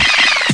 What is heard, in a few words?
Electro FX; Ueb HE Electro FX